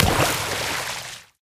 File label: phys paint bomb